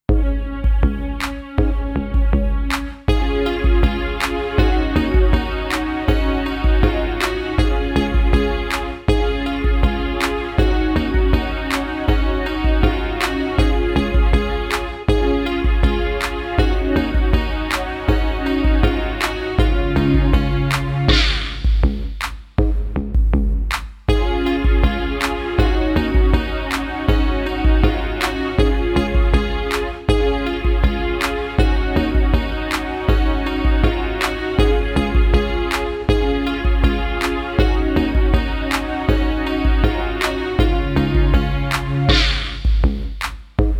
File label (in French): VERSION KARAOKE; Alex et Zoé 1